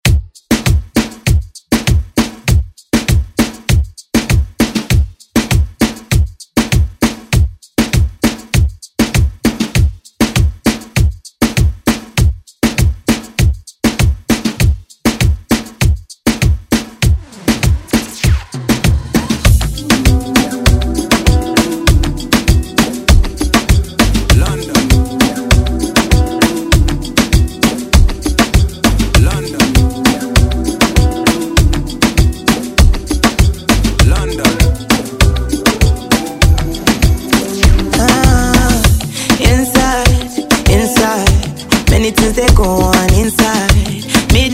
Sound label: Moombahton Redrum